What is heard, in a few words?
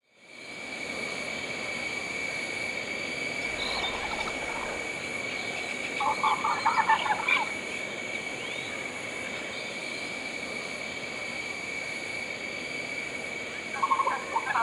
Jungle Sounds Soundscape